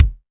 SR16 Kik Dry Wood, Bass Drum - Kicks

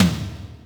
Yamaha RM 50 Toms

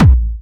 Medium, kicks

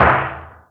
Snaredrums, Roland MC-303

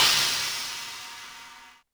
CYMBAL, Yamaha RM 50

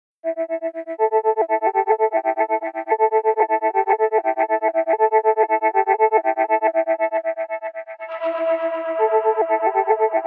Mood, Wakeup tones; Nokia Ringtones; 8800 Arte

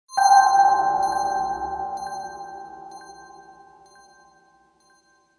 Message; Nokia; N93i; Digital

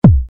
kick

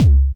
kicks, Bass Drum

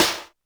snaredrum, Yamaha RM 50

